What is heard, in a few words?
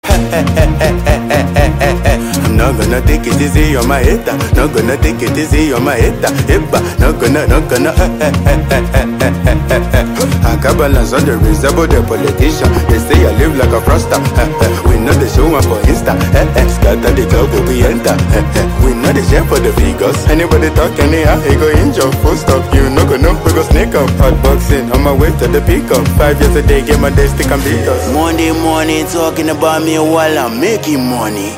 hehehe